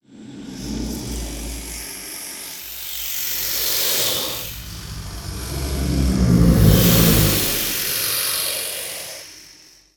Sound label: Serpent Hiss Loop Mono; destructibles